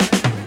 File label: Fill Ins 128BPM; Vengeance Samples; VEH2 Loops